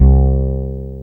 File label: F-BASS